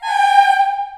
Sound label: G4, CHILD, Choir; CHILD IHS